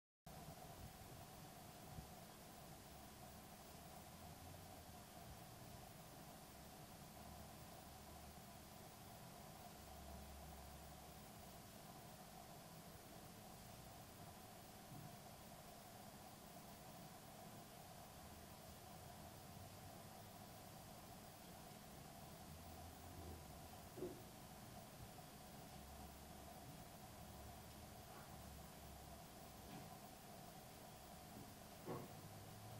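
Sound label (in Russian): zvuki tishiny, silence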